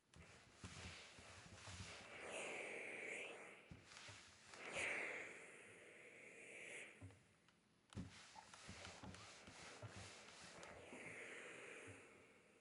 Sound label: Iron